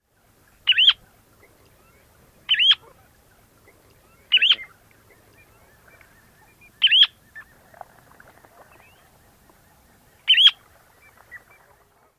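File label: SEMPLO cherry-up calls, semipalmated plover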